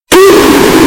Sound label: oof